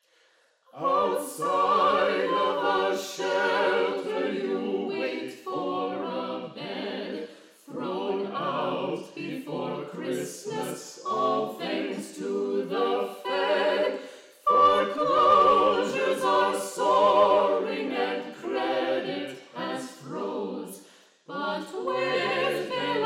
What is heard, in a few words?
away in a manger, chants